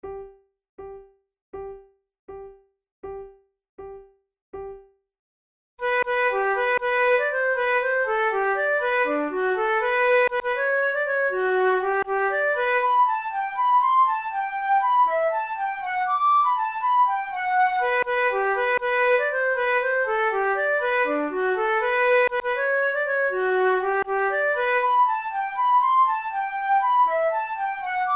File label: duet, flute